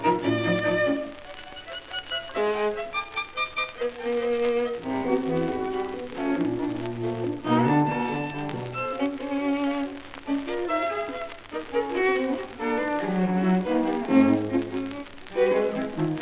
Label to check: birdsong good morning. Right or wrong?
Wrong